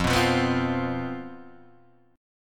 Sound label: C Major 7th Suspended 4th, F, guitar chord